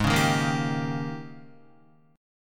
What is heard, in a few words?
Ab Major 7th Suspended 2nd Suspended 4th, Guitar; strummed; JGuitar